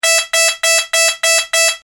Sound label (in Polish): ton